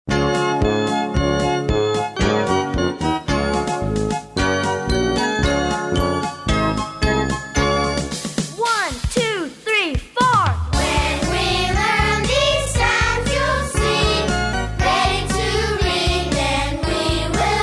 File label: english sound map song